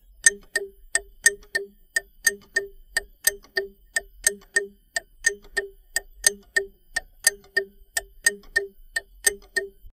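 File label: a high and old pendulum, time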